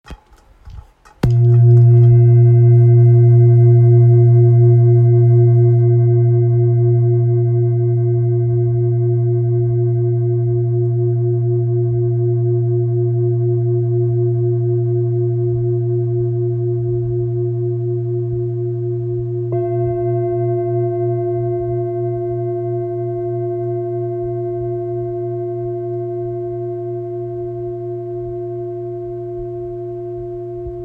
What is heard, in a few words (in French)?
Bol bengale fa